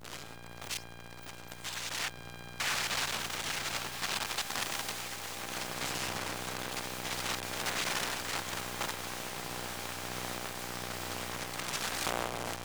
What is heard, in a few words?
Electromagnetic Computer On Sequence Mono; Essentials Series NOX SOUND